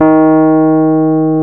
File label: PNO, Rhodes Mk.V; Keyboards of The 60's and 70's